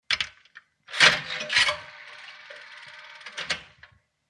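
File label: British Std Call Box 3 Pen, coins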